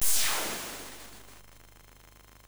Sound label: sid MOS6581R2; hvsc